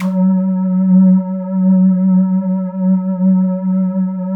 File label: LEADS; Masterbits; Vintage Voltage